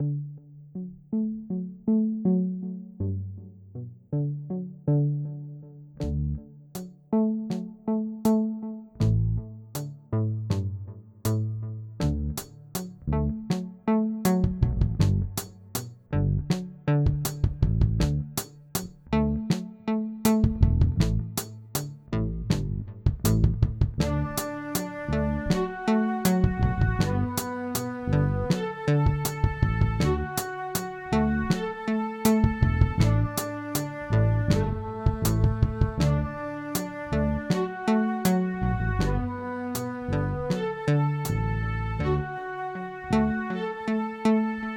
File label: music; Ninja Jump and run